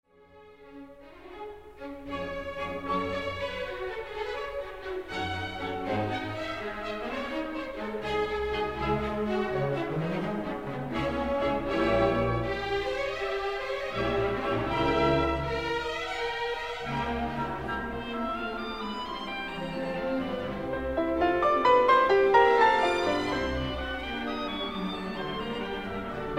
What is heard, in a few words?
Piano Concerto